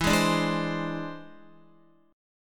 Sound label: E Augmented 7th E down Guitar; E+7 strummed; JGuitar